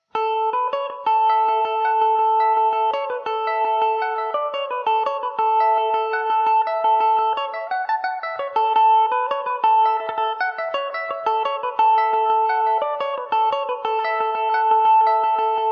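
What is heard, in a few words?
A major, Irish mandolin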